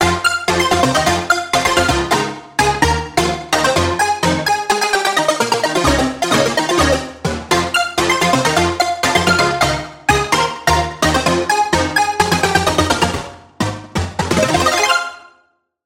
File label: Key; Castle Dungeon; Routed Sound; Spire Expansion - Hyper Gamer Vol.1